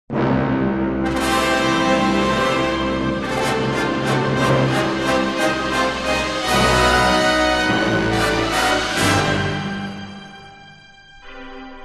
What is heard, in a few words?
Fanfare in C